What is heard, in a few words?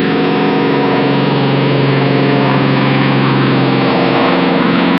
the roar of the plane; airplane